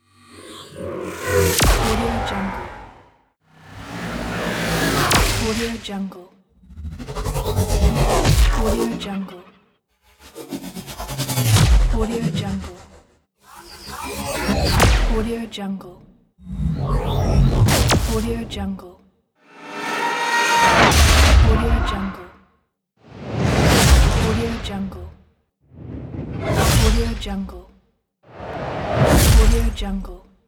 cinematic whoosh impact sound pack

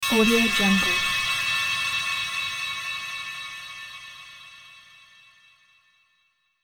astral star hit

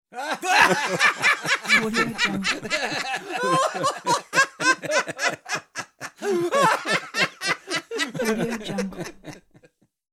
three men laughing

